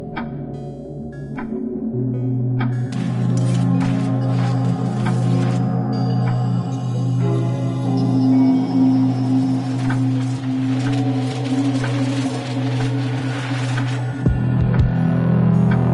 clock music; We Were Here

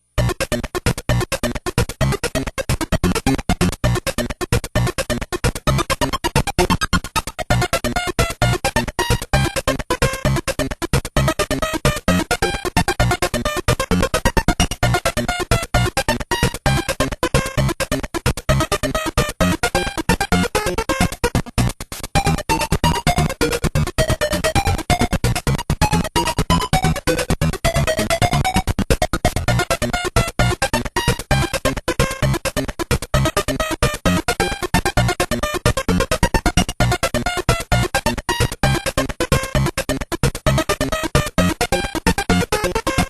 grass land stage; kirby s dream land 2 ost

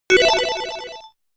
confirmation; Super Street Fighter II

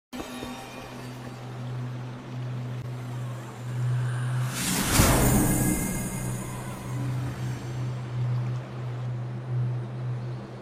meditation; kena bridge of spirits

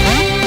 ball; pokemon x y

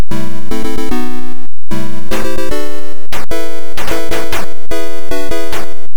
level start; commando

